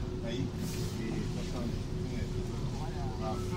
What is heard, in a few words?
ambiance, Metro